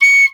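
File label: piccolo staccato C#6; Flutes; DirectWave; Varazdin Orchestral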